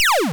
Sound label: Laser